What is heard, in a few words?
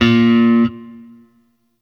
Sentient Shawzin Oct Two A Sharp; Warframe